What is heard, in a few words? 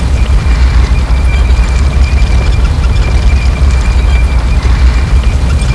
tank run